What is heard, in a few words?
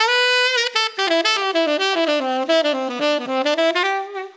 Sweet Major7 8beat Dmaj 110